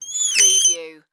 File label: Spider Monkey Howl